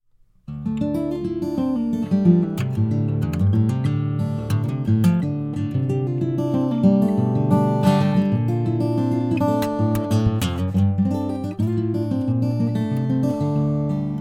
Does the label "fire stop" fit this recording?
No